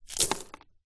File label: foley glass pieces throw drop onto thousands small broken plastic tub; zapsplat